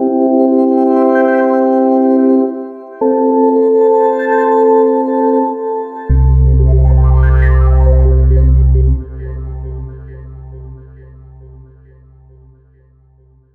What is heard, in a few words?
space organ for virtual synthesizer